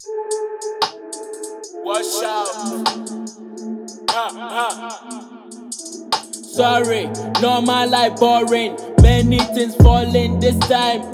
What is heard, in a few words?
Freestyle